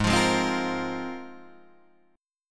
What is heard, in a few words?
Ab 6th Add 9th Ab, Guitar, Open D